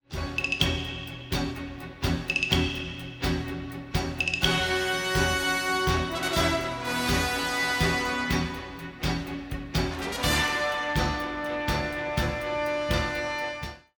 won round; music kits custom

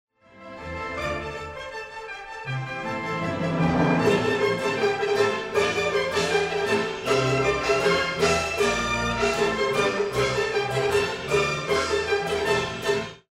won round; music kits custom

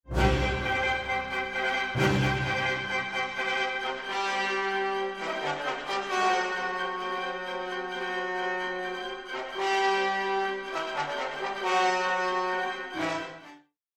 won round; music kits custom

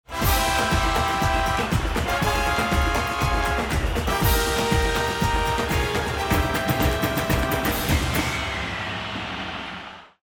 bomb ten sec count; music kits custom

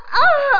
death

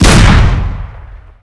Enforcer Fire, weapons; Requests Studio; UT3

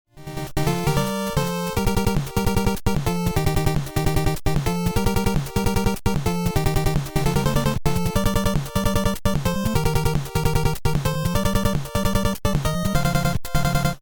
lost round

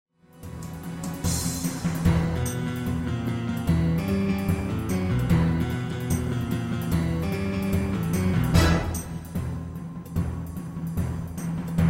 bomb planted; music kits custom

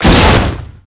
Bio Rifle Rotate; Requests Studio; UT3

